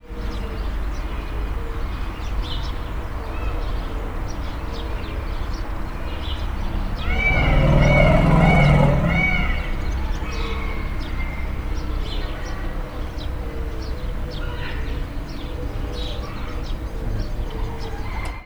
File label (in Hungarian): halkan hörög, oroszlán; állathangok; nyíregyházi zoo 2010